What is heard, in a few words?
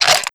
shells ammo; serioussam2